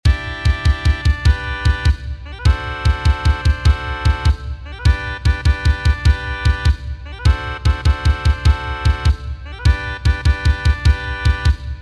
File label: Irish Washerwoman Solo G